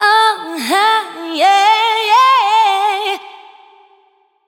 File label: vocal adlib pushes Am